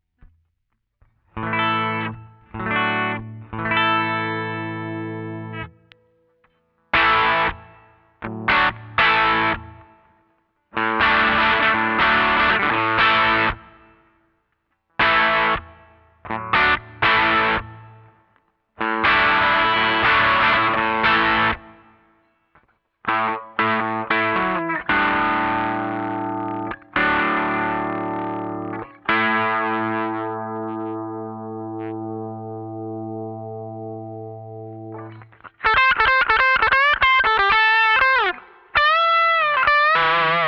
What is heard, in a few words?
LesPaul Honk Machine